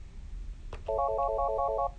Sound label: Primary Extension on Phone, Ring Tones